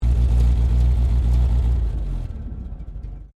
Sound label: APC FV603 Saracen Shutdown, British Saracen Light armoured vehicle; Trucks; Vehicles; SoundEffects-Foundation1